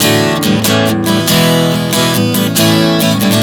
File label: A-E-D 140 bpm, acoustic guitar chord progressions